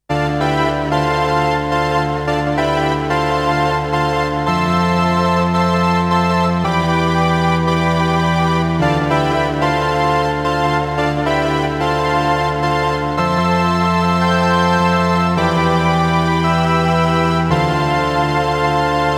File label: T REX Replicator Module Sielorchestra Mono Chorus